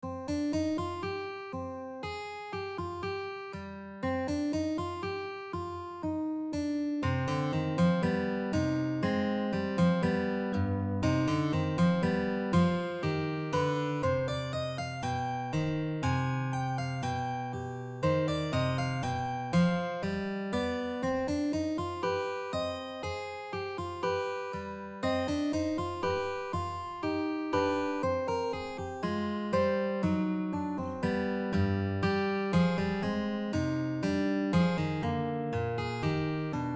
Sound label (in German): Fuga in c Gitarre